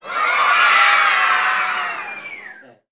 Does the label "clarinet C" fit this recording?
No